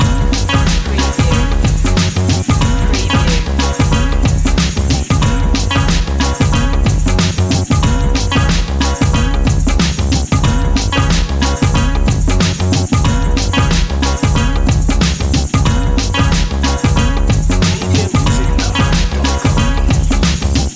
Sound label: groove loop